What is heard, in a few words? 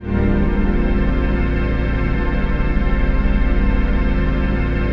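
trance pad; Best Service; XX Large - Pads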